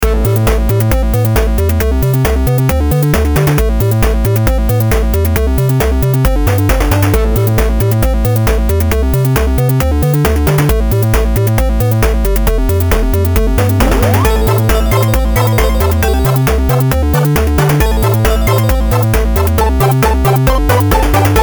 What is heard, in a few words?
game 8bit